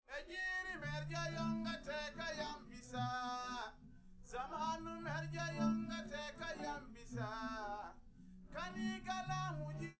no drums, live rec; SiSEC11; underdetermined

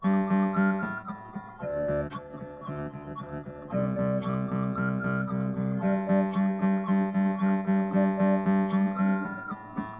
nodrums; SiSEC10; underdetermined

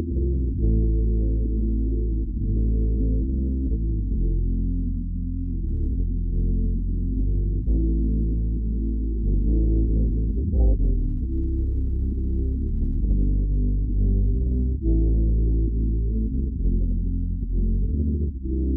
bass; SiSEC11

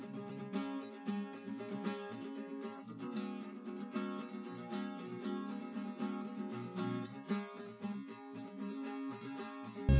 nodrums inst sim; SASSEC07

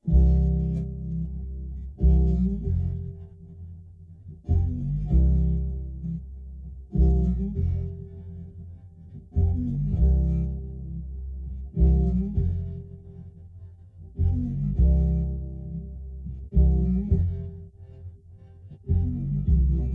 bass

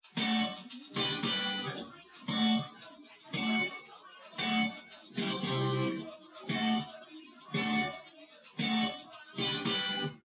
drums; SiSEC11; underdetermined

